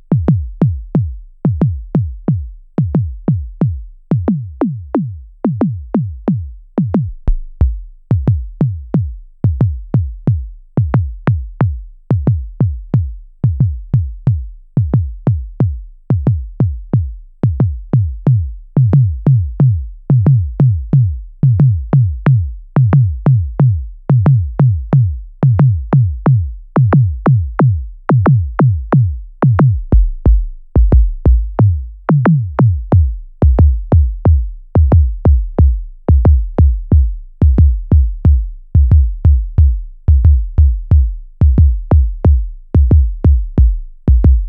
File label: Kickdrums